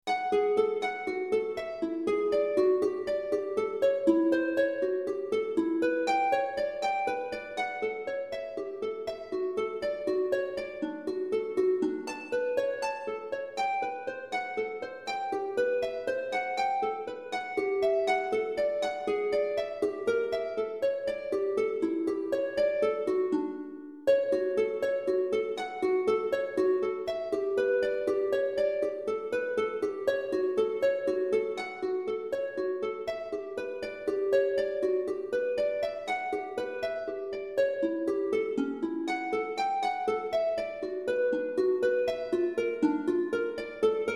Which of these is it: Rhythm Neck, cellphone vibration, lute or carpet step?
lute